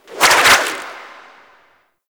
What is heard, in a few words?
Kumihimo String Whip; Warframe; Koumei